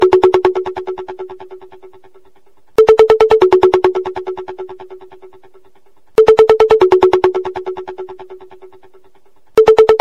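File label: Drum Beat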